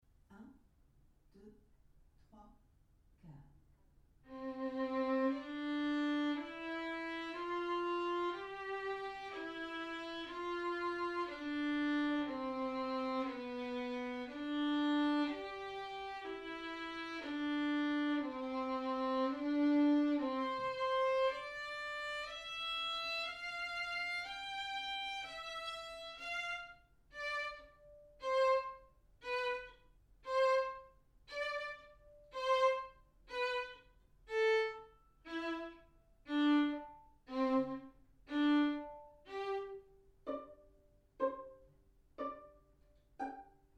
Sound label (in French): Violon Seul; Solfege